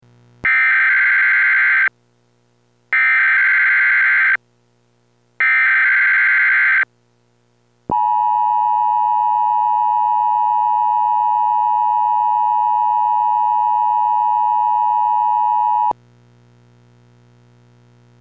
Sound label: required monthly test